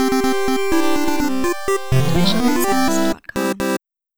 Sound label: 8-bit cheer